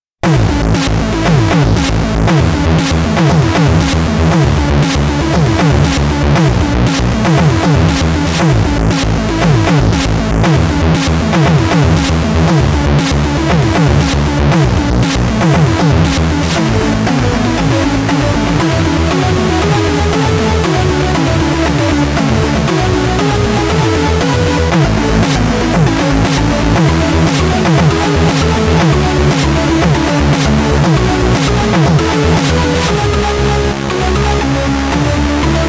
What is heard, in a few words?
1 bit is enuff; MISTER BEEP